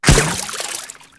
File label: cg water; Tribes2